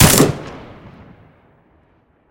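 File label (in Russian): улетевший вдаль снаряд после выстрела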